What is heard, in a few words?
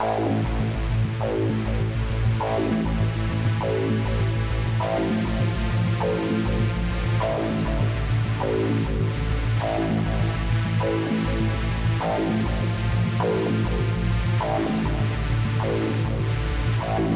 Synth come in; Void Bastards